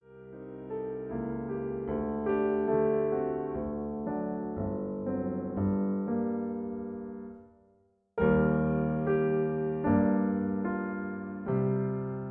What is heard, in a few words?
Accompaniment G; 4 Serious Songs Op 121-2 Ich wandte mich und sahe